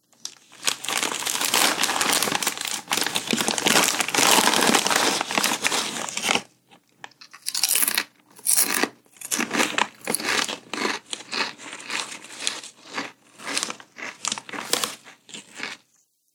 Opening And Then Eating Bag Of Crisps

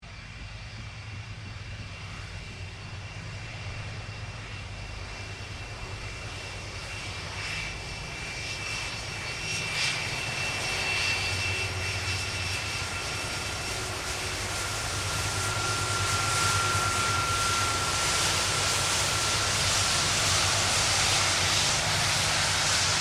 1995 A320 AIRBUS TAXI; Airplanes; Vehicles

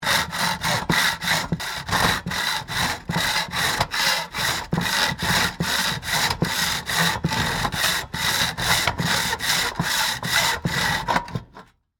Industry, Hack, Saw, Into, Sheet, Metal; Saws; Machines; Blast Wave

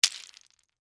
Debris Mixed Nuts, Pile; Texture; Debris Sound Effects; Materials

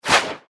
Cloud Hands Swift Whoosh; swish, sweep and swoop; Andrew Fleming

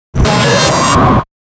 Hiss Digital Feedback Blip; Production Element Imaging Element Accent Transition; 5.1 STEREO MIX; Blastwave

